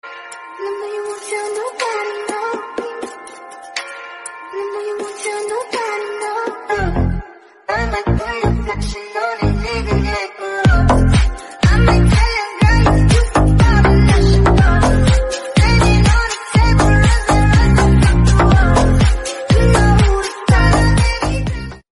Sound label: dj remix english songs ringtone